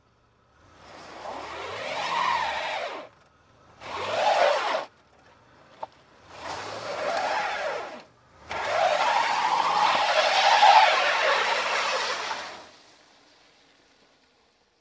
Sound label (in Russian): 2 korotkie prokrutki i 1 dlinnaja